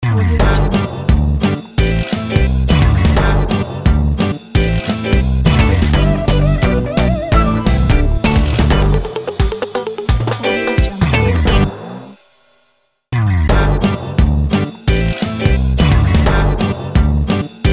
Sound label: vintage advertising logo